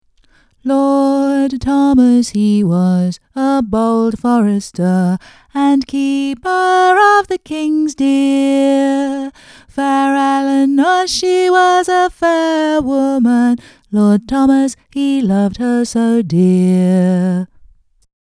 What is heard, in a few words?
LEAD VOCAL; EFDSS; Lord Thomas And Fair Eleanor